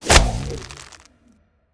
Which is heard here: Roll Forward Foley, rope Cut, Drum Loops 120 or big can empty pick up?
rope Cut